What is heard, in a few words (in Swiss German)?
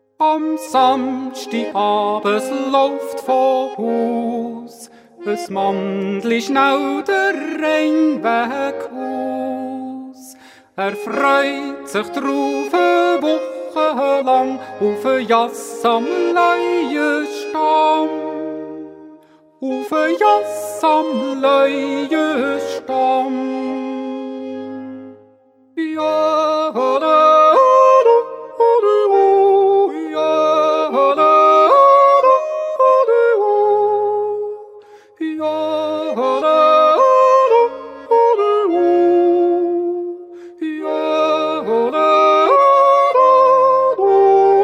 Es Jässli mit Fouge (Einzel)